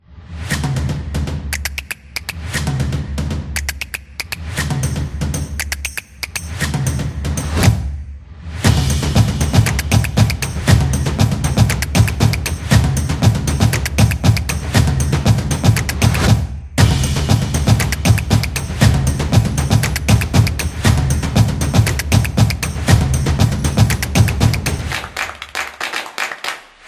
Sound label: A Percussionist